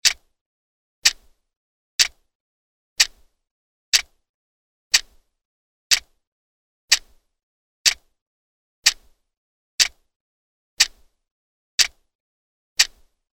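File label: sound of clock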